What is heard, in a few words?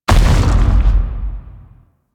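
Shockwave Ground Impact; Enemies; Abilities; Warframe; Corpus; Arachnoid Camper Terra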